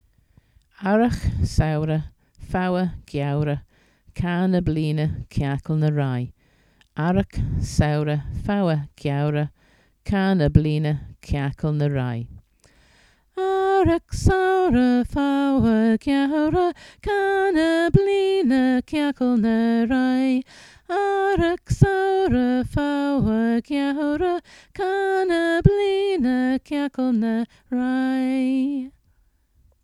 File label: seasons song